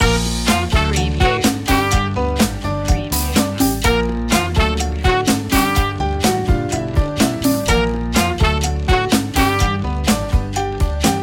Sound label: latin fiesta on the wall loop